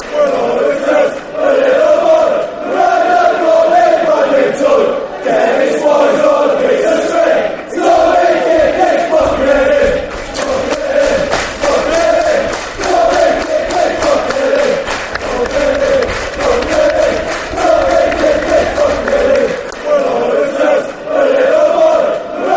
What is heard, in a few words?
fanchants; dennis wise piece string